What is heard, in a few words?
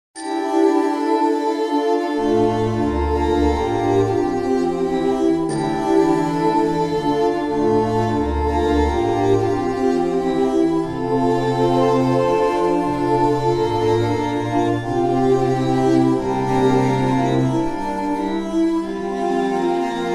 nocturne